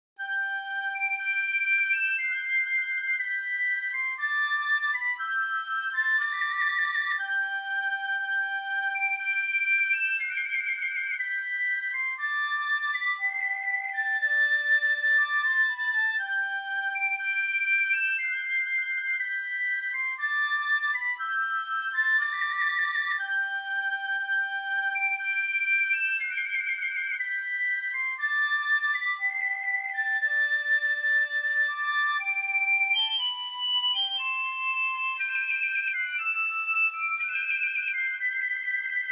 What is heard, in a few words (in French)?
deuxieme marche françoise